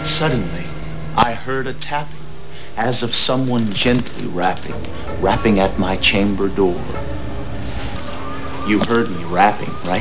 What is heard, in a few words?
rapping